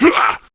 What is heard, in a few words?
Pain, Male; Warframe; Grineer Male B; Battle Barks